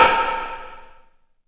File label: REV HIT